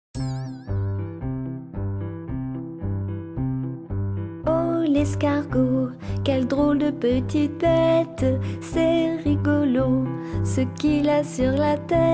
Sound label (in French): Chanson pour enfants